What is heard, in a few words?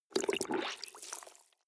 clothes water wring soft, haushalt; haus garten